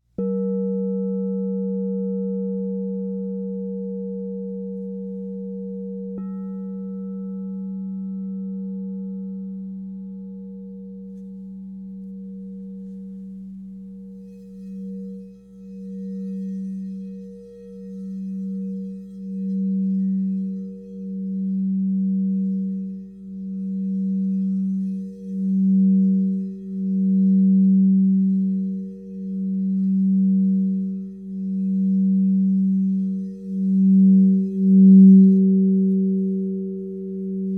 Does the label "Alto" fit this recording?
No